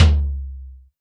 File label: huge tom c key